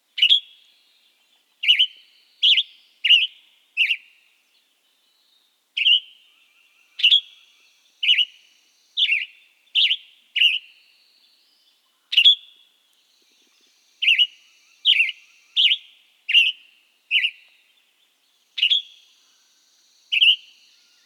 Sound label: western tanager song and calls, bird song